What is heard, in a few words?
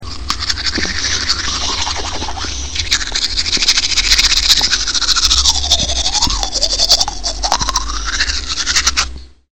BRUSH TH